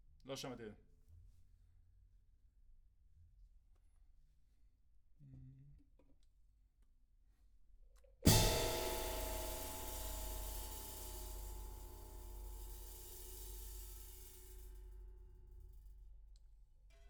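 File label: Overhead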